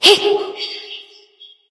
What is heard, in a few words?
grunt jump light; smite; voicepack; hel